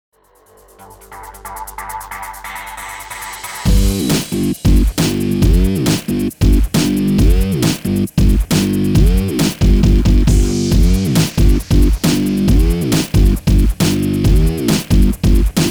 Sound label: Exercise Run School 136bpm remix